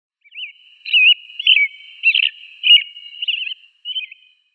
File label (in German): Wanderdrossel Turdus migratorius; Vogelstimmen